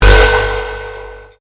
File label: Convolution Pulse